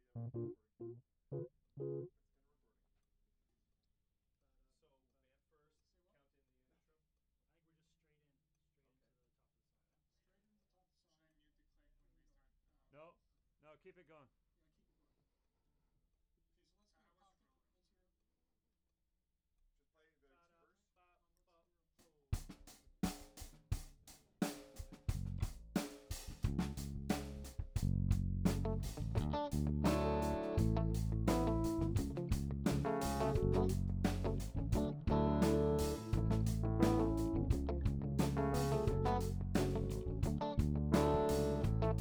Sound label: Rough Mix L